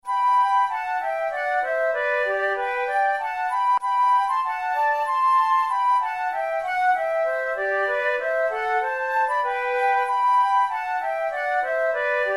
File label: duet